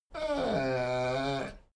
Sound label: dogo argentino whine medium; natur-tiere; hunde